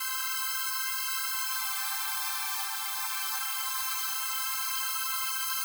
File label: One Hi Strings; пэды